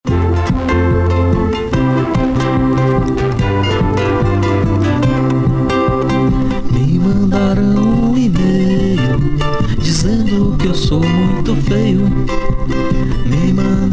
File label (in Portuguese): marcha